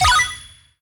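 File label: System Bonus Balloon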